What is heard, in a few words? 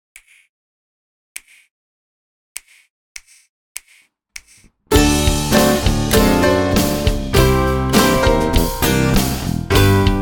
Blues